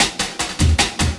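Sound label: loop snare drum, 150 BPM, drum & bass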